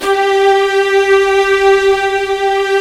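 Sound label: orchestra strings G1; GRANDSTRG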